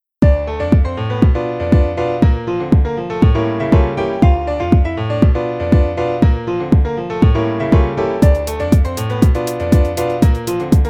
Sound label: DRAMATIC ELECTRO